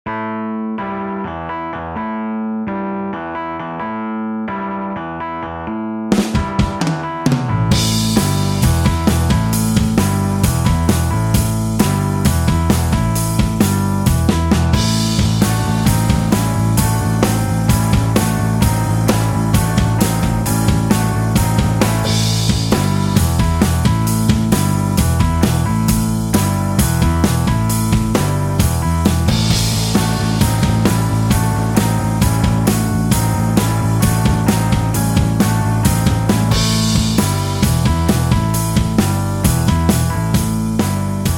(A) No Lead Guitar

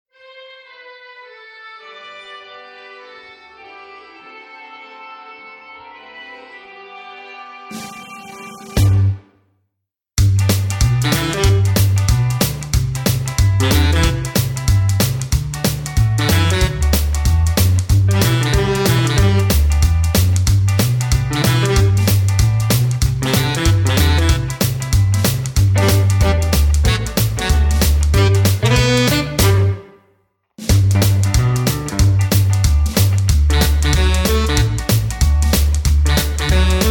No Backing Vocals

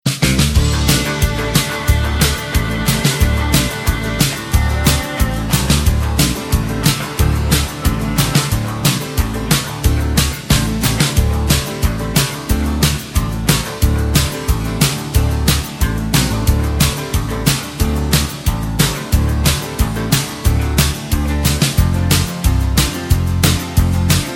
(D) No Backing Vocals

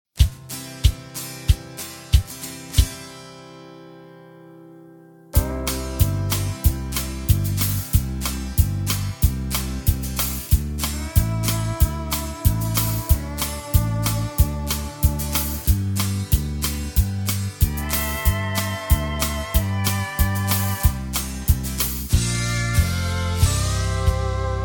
Medley No Backing Vocals, backing tracks

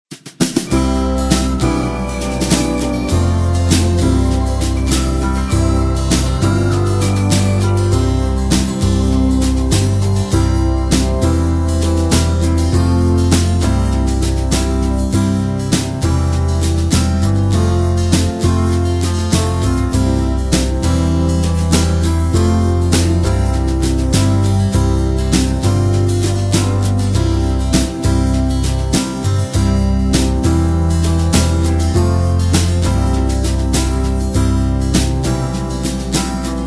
C No Backing Vocals, backing tracks

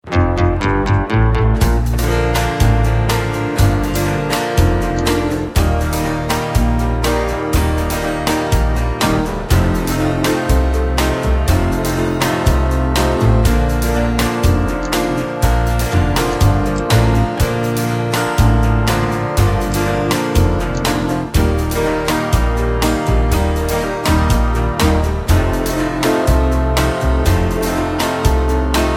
Bb To C No Backing Vocals, backing tracks

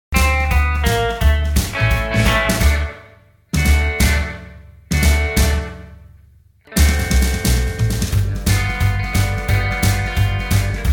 D No Backing Vocals, backing tracks

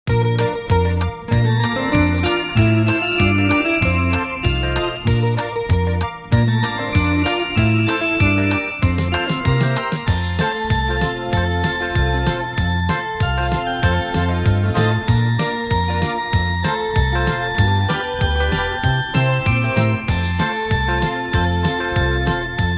Jingle Bells Medley

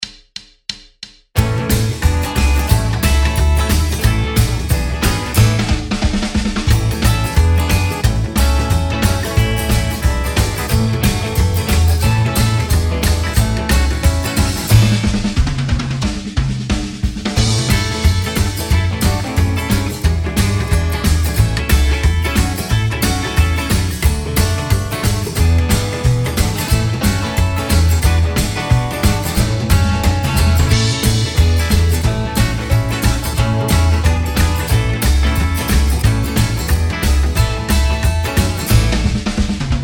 (A) No Lead Guitar

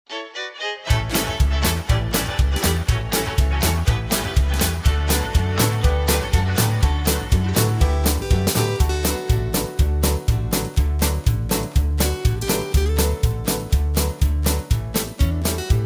D No Backing Vocals, backing tracks

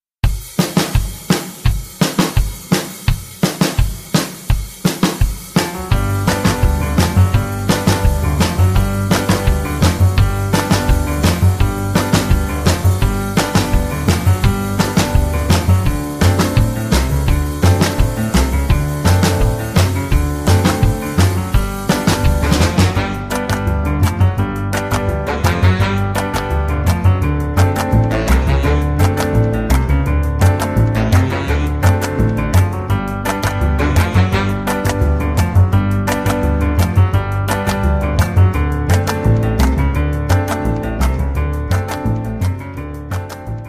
(G To Ab) No Backing Vocals

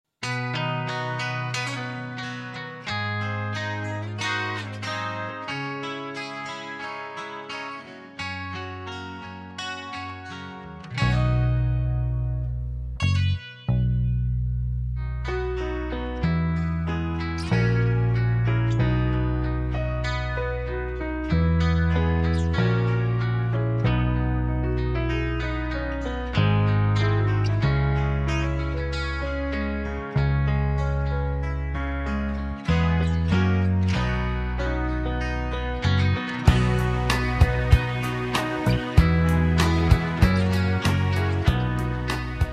(B) No Backing Vocals

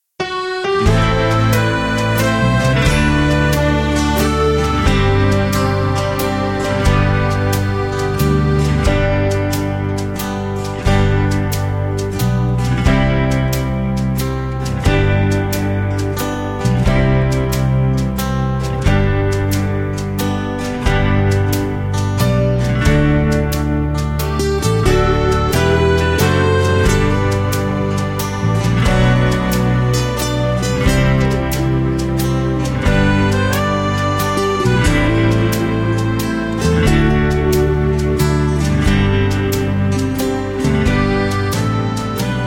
G No Backing Vocals, backing tracks

